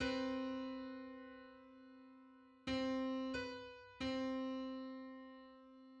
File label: Four hundred eighty third harmonic on C